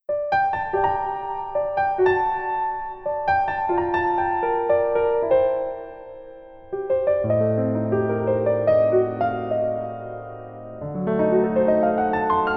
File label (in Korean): Piano ver 멜로디MR -1키